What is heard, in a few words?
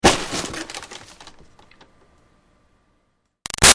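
Foley Box Hit Christmas Present Roomy; Crinkle; Hits; Xmas; Objects; Big Room Sound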